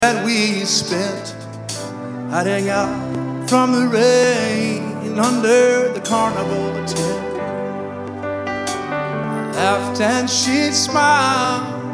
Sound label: Sad Mood